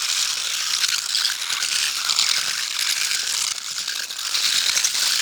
Skittering Spiders; Nicktoons Nitro; arcade

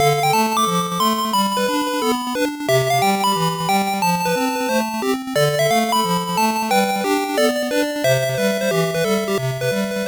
Minigame BGM; Issho ni Hashirou Kikansha Thomas; Pico gamerip 1995

